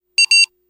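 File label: Computer Tone Double Be, beeps & tones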